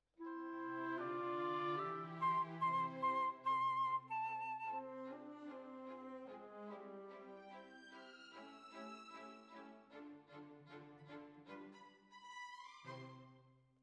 Flute

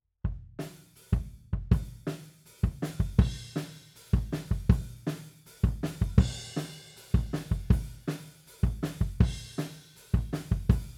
Kick Out, 160 BPM

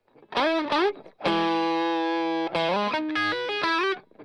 Strum Line

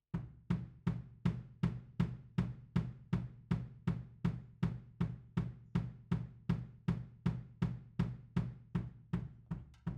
Overheads, 160 BPM

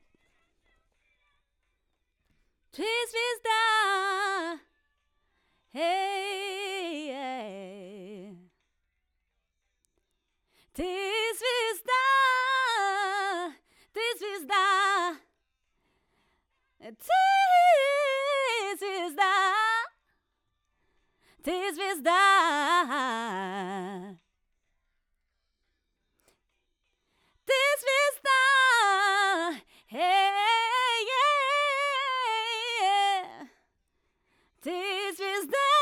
Soul

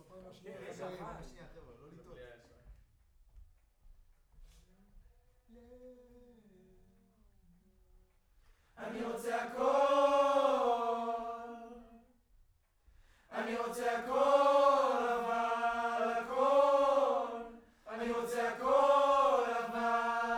Choir Far

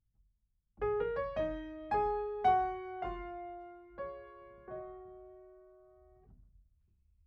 Piano Mono

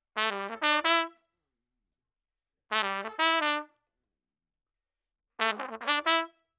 Trumpet

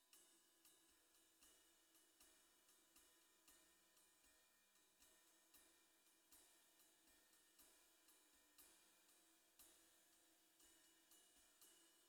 SNR Bot

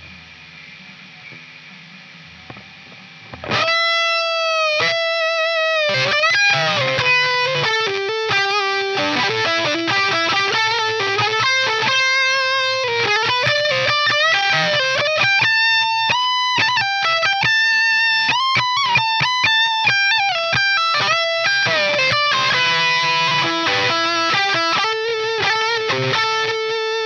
Chorus Gtr

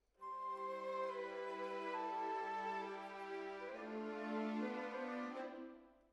Decca, Jazz for piano

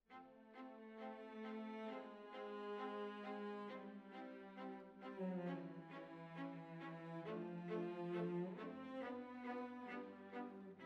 Violas Over

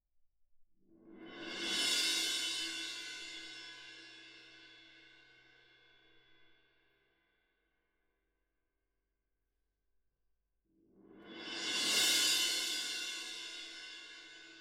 Perc

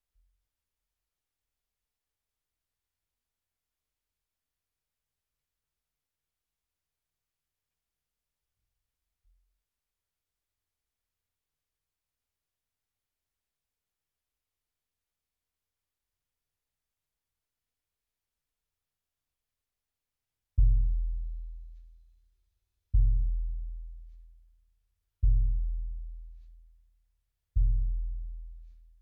BD In

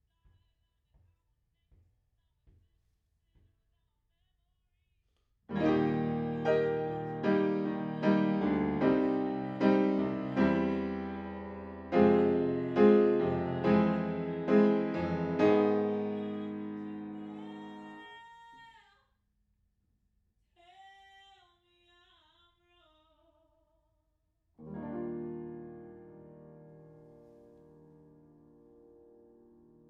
Piano Room

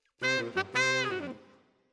Tenor, RNR for piano

